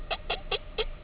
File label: trumpet, whale songs